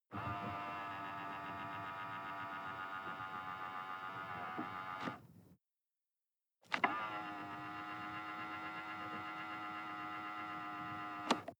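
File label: sports car interior side mirror adjust; verkehr transport; autos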